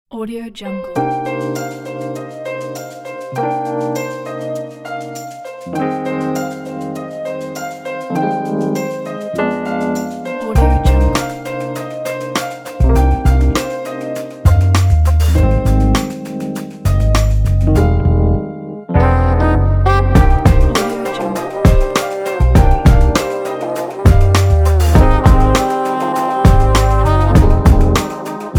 night city soul hip hop piano saxophone; vvasin

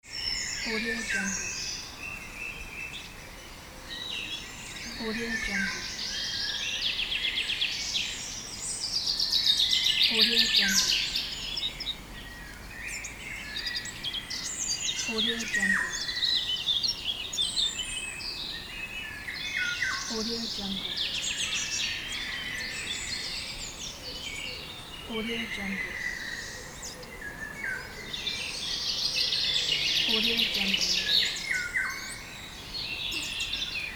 nature

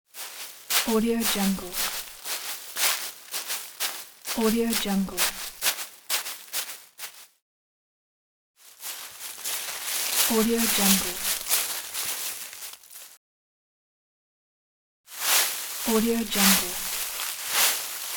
walking on leaves; kaelv

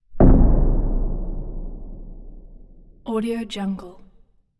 explosion mid woody

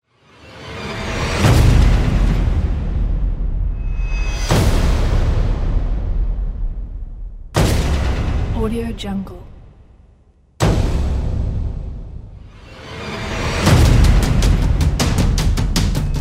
action drums

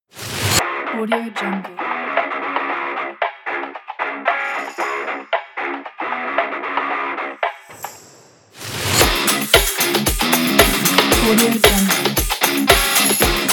motivational metal rock music

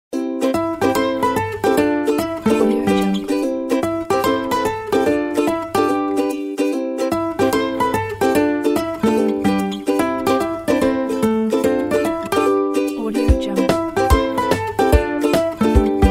kids music

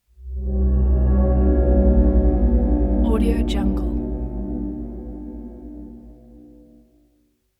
blue velvet swells; sinematic 2; soundmorph

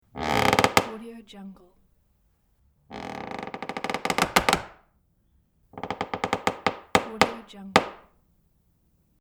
wood creaks pack

